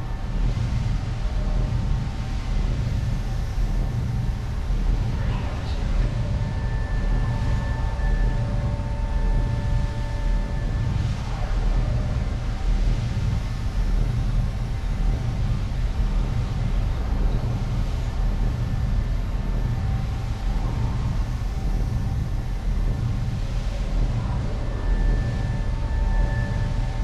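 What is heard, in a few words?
interior power relay room; Titanfall 2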